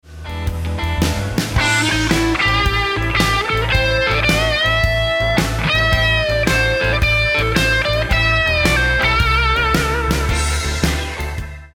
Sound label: Licks Lick, guitar; Beginner/Intermediate Shuffle Blues licks